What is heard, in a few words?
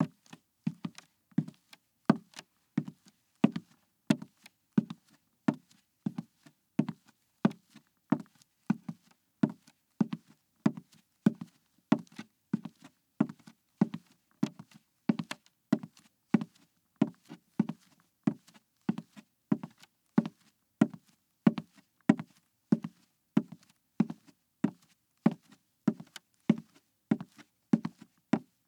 High Heels; Footsteps; HauntedBloodlines